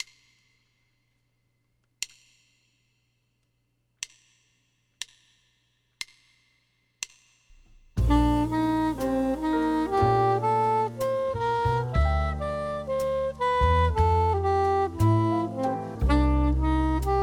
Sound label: Bb Soprano; First Steps